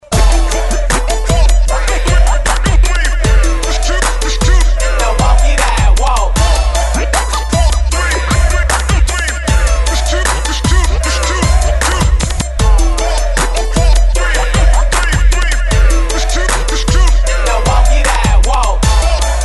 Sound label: 2step